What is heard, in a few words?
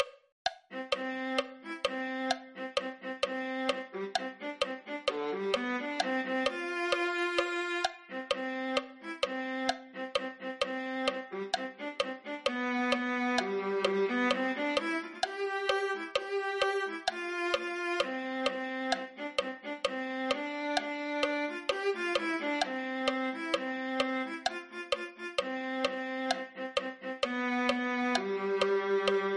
viola